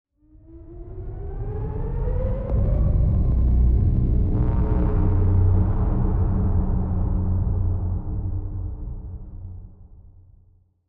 psi ship colossus move; ships; Stellaris; shroud

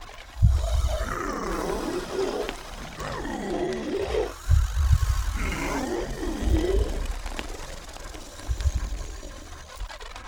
dimensional horror idle; Stellaris; guardians

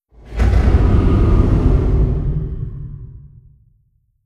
distance neutron fire layer; apocalypse

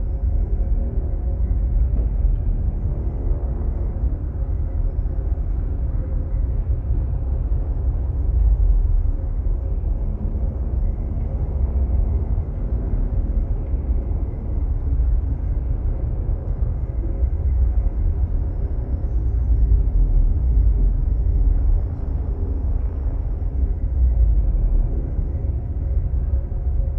ship battleship idle; Stellaris; shroud; ships

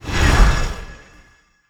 sfx construction ship moving out; Stellaris; nemesis

